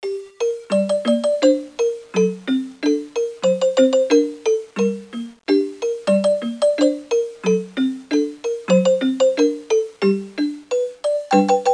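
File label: xylophone; My Science Fair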